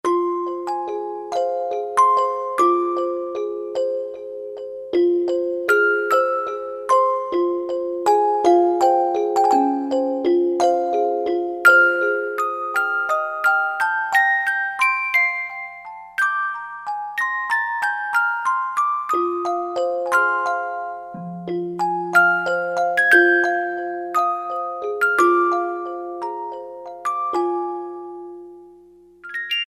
Swiss Box, Korg N364; Factory Prog demos

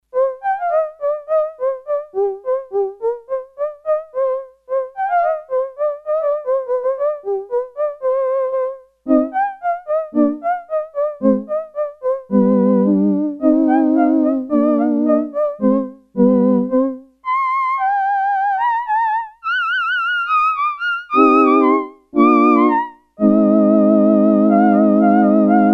Vocalings, Sequential Circuits Six-Trak; Factory Programs

